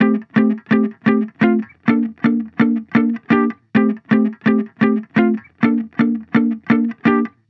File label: Funk Chord Loop, 128 BPM F Maj, Guitar Loops; Instruments; House